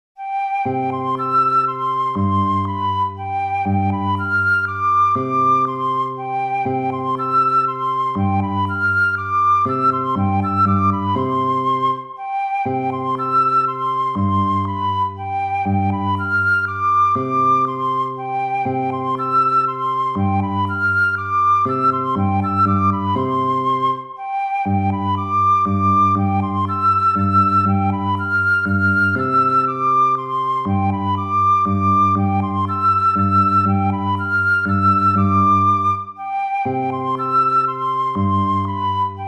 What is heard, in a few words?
waltz; Carulli Ferdinando